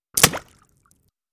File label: Bullet Impacts Water